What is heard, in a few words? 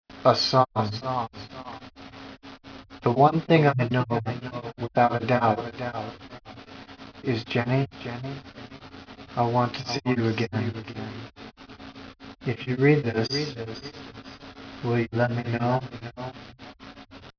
a song